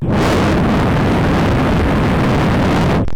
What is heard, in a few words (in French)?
fusee; DoodleJump